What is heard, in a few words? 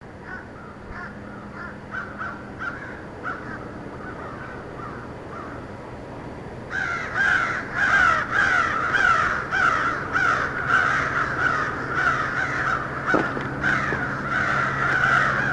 crows